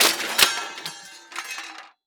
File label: Crash Concrete Dropping On Metal And Plastic Debris Short; crashes; AdobeCC